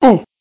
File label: grunt male